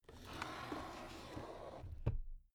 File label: drawer cabinet stuff close soft